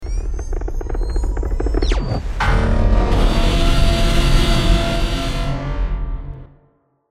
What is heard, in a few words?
sfx; mechagodzilla 2021